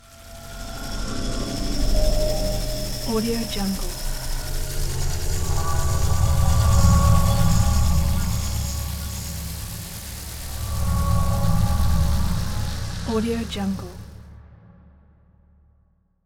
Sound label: textures long stutter organic; sinematic 2; soundmorph